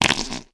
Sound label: human fart; sound effects one